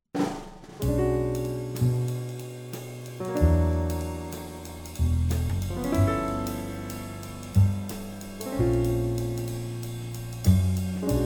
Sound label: Cocktail Lounge